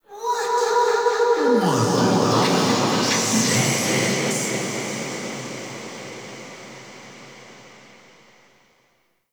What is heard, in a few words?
Cave Echoes; Warframe; The War Within